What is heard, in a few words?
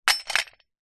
household padlock metal set down concrete ground